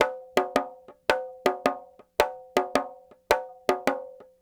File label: Petia, World Percussion